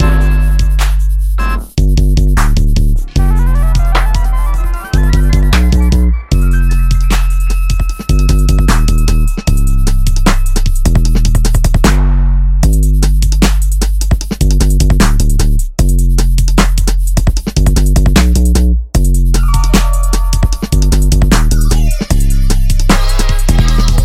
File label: knockout